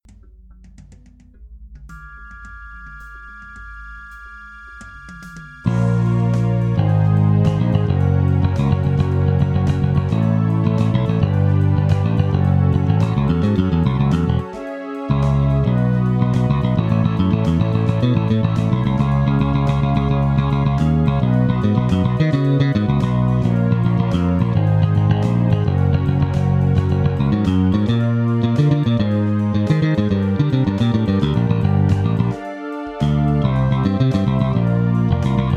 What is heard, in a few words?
BASS Jbass pick; MODO BASS; IK Multimedia